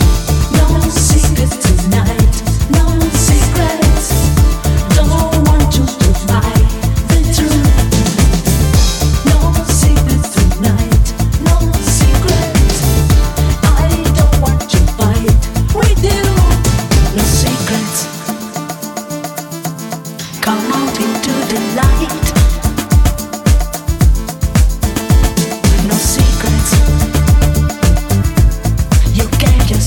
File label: AMBIENT VERSION MIX